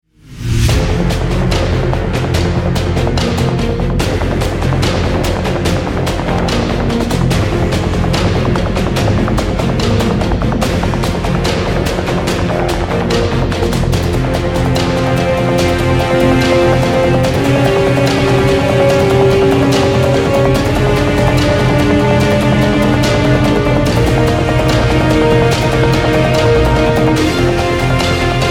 bomb planted; CS2; Counter Strike

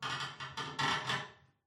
ambient wood creaks

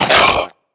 choke, zombie vox; creatures

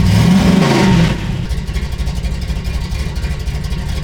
v8 rev short loop; vehicles; Half Life Alyx

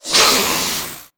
attack screech, headcrab black; creatures; vox

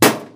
metal computer impact hard; physics

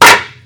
wood plank impact hard; physics

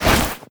mantle, foley

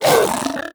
attack miss, zombie; creatures

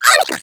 egg bot hot laser; player; Portal 2

